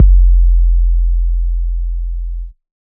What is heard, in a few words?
Bass, Dubstep 808 Basses; GhostHack